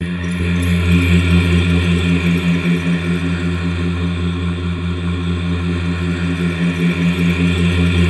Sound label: PADS; OMNIVERSUM; Masterbits - Tekkno Trax; SYNTHFX+PADS